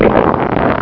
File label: Claw; Realmz